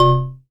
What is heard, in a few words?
VS BEL PLUK; Synclavier Sampler Library; KEYS AND GUITARS